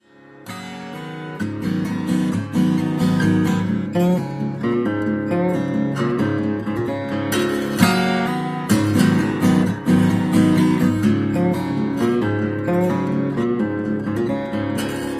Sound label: Howling Wilderness